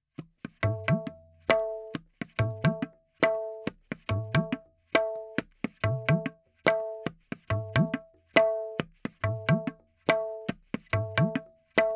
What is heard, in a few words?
Tabla, 4-4, 70; Sample Banks; Percussion